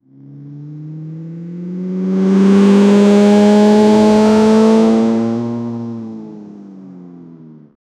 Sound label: gt3 car that accelerates, motor